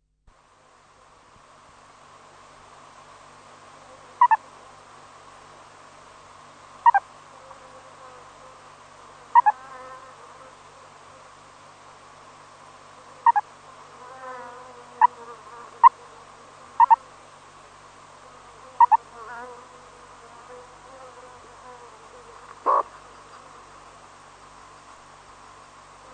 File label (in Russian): surikat golos